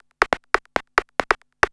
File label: percussion loops, Techno; PS-45